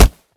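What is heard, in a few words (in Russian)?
бросок ножа в деревянную цель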